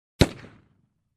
Pistol shot distant

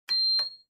Cockpit Beep Sound

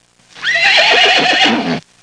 Farm horse single neigh

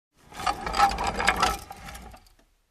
Object Rustling

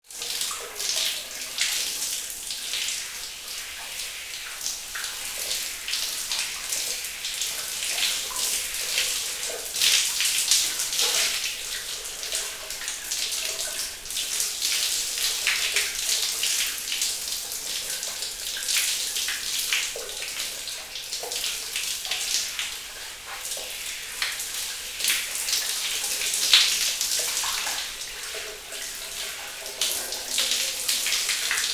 Taking a Shower

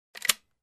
Machine gun reloading click sound

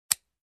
Carving Wooden Stick

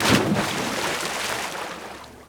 Hard Water Splash

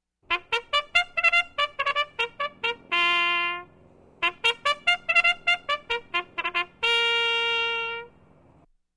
Trumpet Assembly

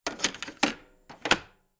Cassette in close

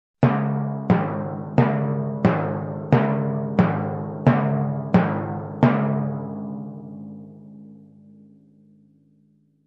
Fat man walking timpani groove variant

